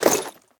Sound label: armor heavy; smite; chiron